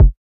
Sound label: Kick; Samplepack Produtique